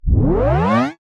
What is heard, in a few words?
Tape Start